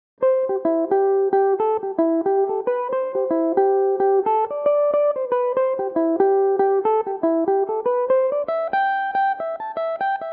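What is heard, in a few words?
jig C major, Irish mandolin; Headwood Crossing; Belmuse EM-190 electric mandolin; Patrick Davey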